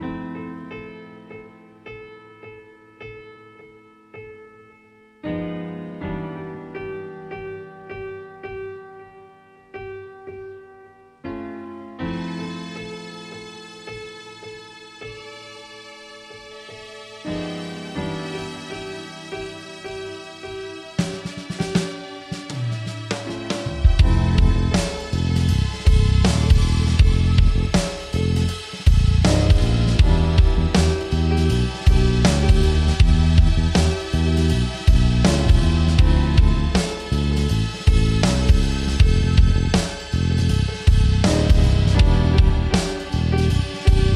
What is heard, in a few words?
Jazz